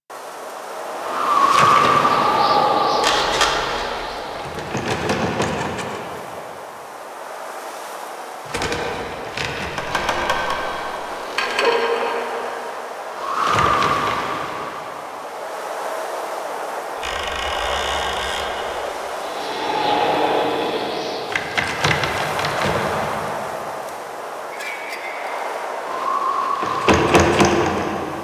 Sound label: Exterior Building Rattle Loop, Ambience; Warframe; Duviri